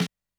Snaredrum, Technics U90

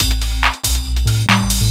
Tink Blips, Synchro Funk, Beats; Sonic Foundry (Sony Creative Software)

